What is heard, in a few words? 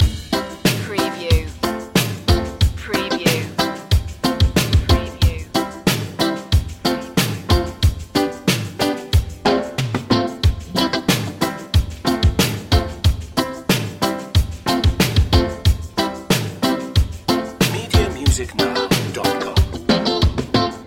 reggae simmons loop